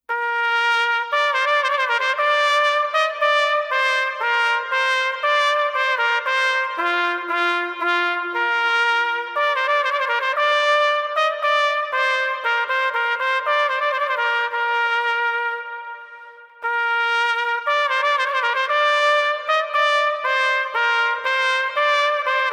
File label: trumpet voluntary